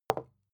glass put down heavy fast; objekte

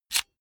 electric drill template slide in fast; maschinen; bohrer; soundpool

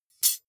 midsize metal thin clank drop

